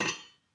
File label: mixer bowl remove